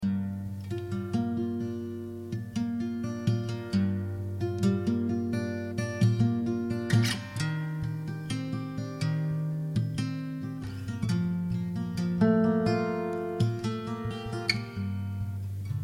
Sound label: Gm 130 Acoustic Guitar Arp